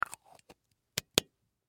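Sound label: sounds of hairspray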